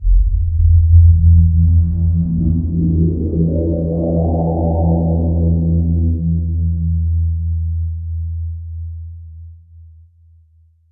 VFX Atmos

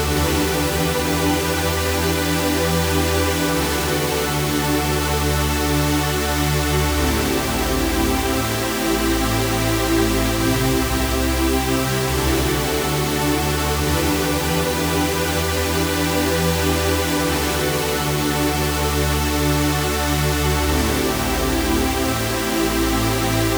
Pad 140BPM, Eminor; Melody & Synth; Vengeance Samples

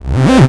Bass C, Offbeat Bass

